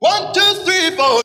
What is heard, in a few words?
1 2 3 4 SUNG; numbers and letters